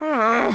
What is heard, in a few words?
Woman Frustrated; Zomboid; Lifestyle